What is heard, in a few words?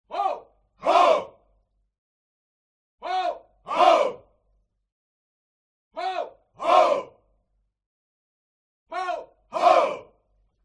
HUMAN CROWD Military Shout Ho; smartsound